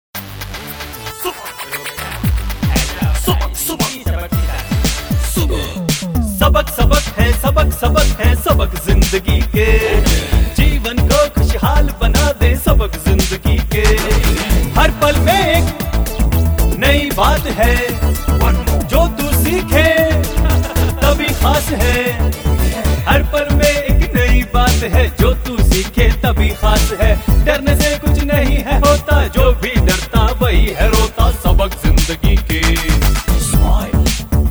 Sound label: SONG, male voice